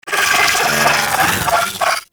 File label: Vocal Idle; Warframe; Moa Satyr